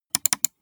gaming mouse left click double; maschinen; buero

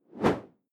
whoosh simple short hard; swooshes-swishes

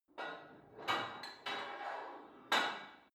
put weight onto barbell unsteady reverby

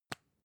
lipstick open soft; haus-garten; badezimmer

